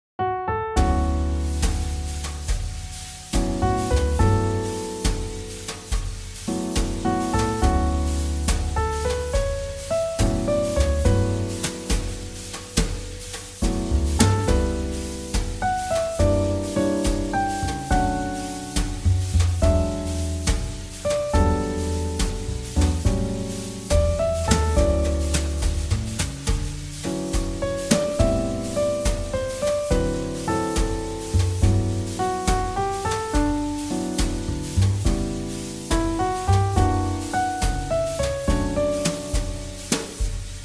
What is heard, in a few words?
JazzBallad; 잔잔